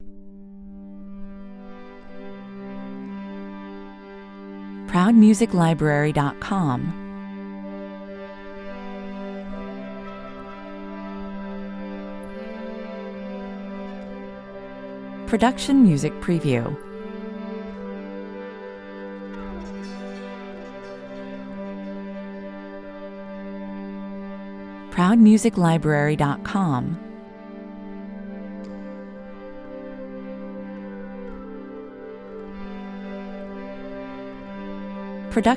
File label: harmonium dronescape, 101 bpm